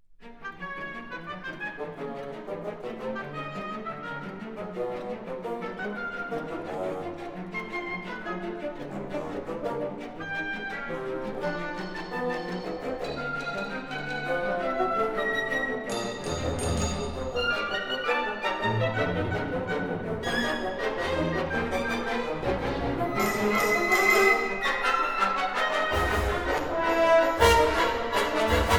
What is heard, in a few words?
Variations for Orchestra, Variation; Schoenberg